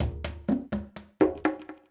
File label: PERCUSSN ETHNO 125, Drum